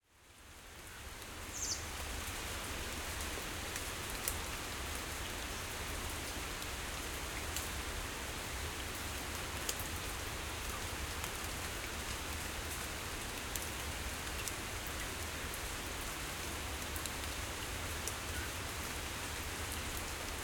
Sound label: Forest Rain quiet with water drop on leaves few birds, Chile